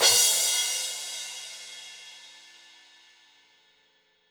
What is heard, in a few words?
Crash Dry Session Hard, Cymbal; One Shots; Drums; Ableton Live 12 Lite; Core Library